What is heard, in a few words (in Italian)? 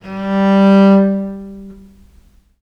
G2, Historical Instruments, VIOLE MUT; USB Soundscan vol.23; AKAI